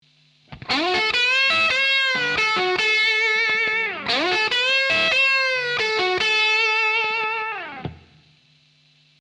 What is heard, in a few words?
lick, blues guitar